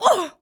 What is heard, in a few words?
female damage